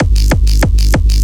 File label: KICK BASS